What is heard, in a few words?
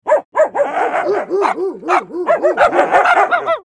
Angry dogs aggressive barking and growling